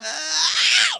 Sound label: ow, Voice ooh ahh ow ouch